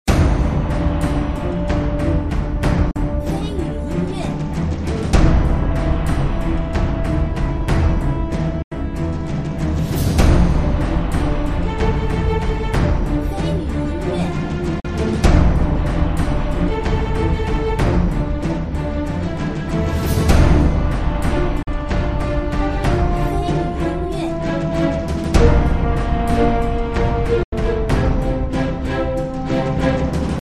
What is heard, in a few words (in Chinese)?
对战-紧张-压迫